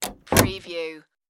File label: room door close